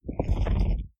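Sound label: shiver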